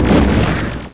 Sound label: LAUNCH; 17 Bit Software 4: Phase Four